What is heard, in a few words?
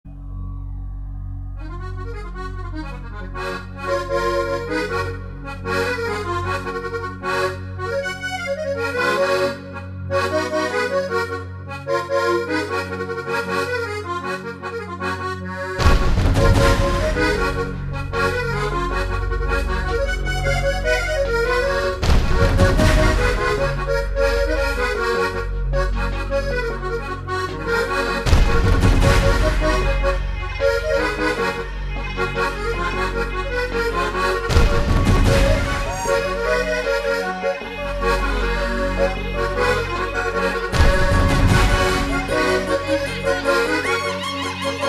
Deep Folk Song